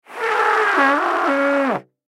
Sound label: Baritone Long, Baritone Long Airy Note Struggle; Cartoon; Musical